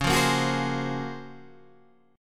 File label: F sharp Major Sharp 11th C sharp